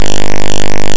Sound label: engine slow, speaker pulses (~10.3 kHz); Called once per interrupt (~50 Hz); each call plays 8 speaker pulses (~10.3 kHz); River Raid; when the player holds down to decelerate